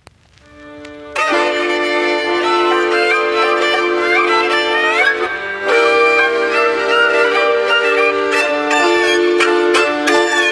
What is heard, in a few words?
Jewish Trap